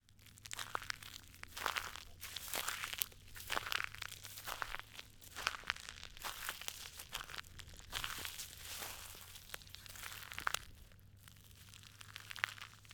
Footsteps On Gravel

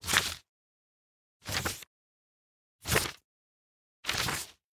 Page Turn

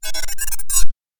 Alien Data Transfer Noise

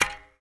Suction Shot Hit

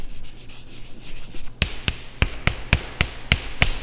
Chalk

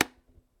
Button

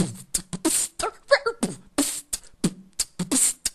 Beatbox

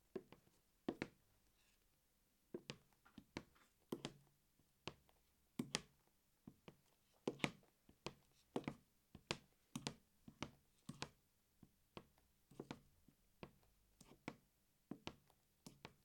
Footsteps On Wooden Floor

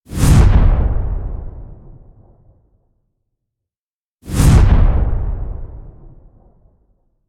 Fast Explosive Whoosh

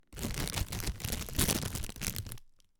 Plastic

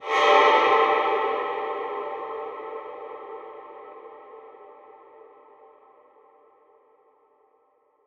Small Swoosh

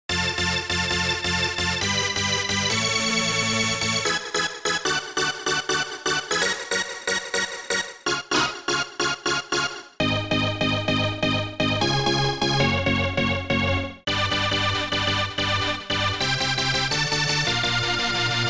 Rave Stabs

